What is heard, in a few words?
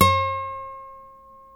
NYL NV D, nylon no vib guitars; Spectrasonics; Hans Zimmer Guitars VOL-1